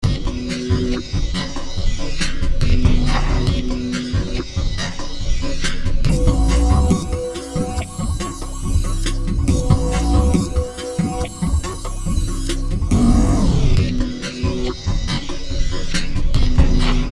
A chaotic sound with beat and synth sound combined.; V-Synth; Autotech (Beats)